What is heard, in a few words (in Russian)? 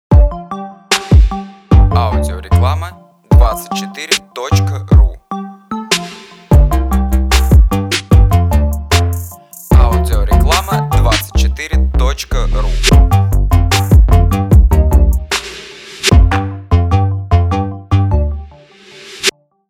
Dzhingl